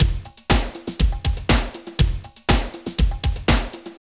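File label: drum loops, right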